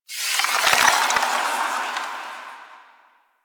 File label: Obelisk Stone Start Move; Warframe; Infested Eris